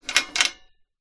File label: industrial chain hung on metal pole movement short